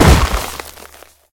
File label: rubble fall; Golden Crayon Sounds